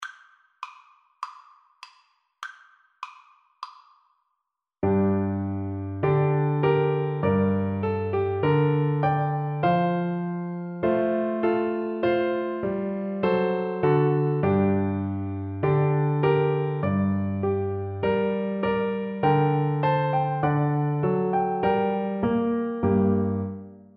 young jemmy, Flute

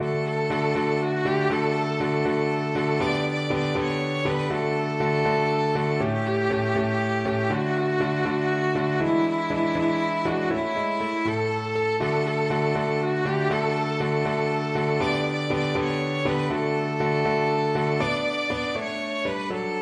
violin

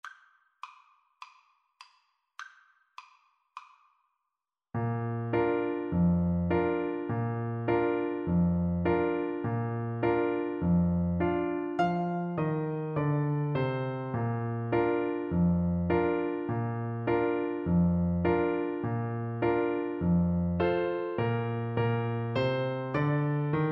Bassoon

